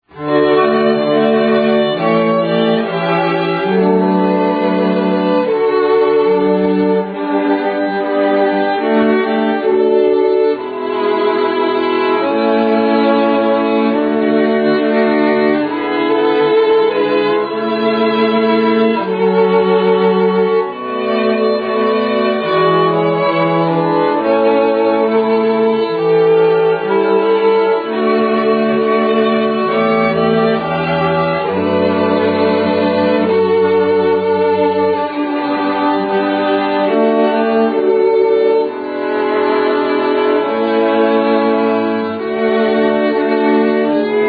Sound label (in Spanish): cuerdas; coros solistas; elgar; pompa y circ